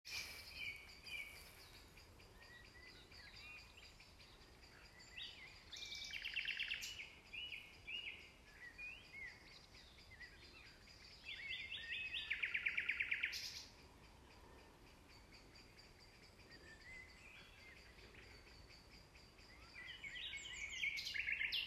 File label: Nightingale